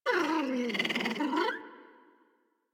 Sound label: Puppy Interact Affection Open Mouth; Enemies; Kubrow; Warframe